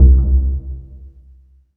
PIZZ, Dance Mega Synth; Best Service; 90 s Sample CDs

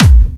Clubby Kicks, Bassdrums

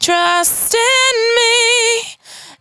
92bpm Bb, Velvet Vocal; Zero-G

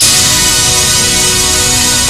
FINALE HIT; Kid Nepro - Elite

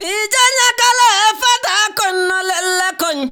VOCAL LINES; Best Service; World Colours

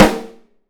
MUTD BRASS; 3D Drums

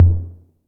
808 LT2 Tape, Tom; Tape808; Goldbaby Tape808 & Tape909

